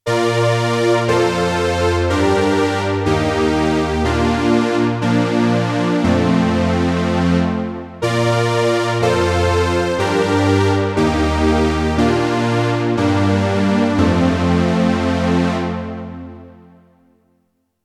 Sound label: Blofeld supersaw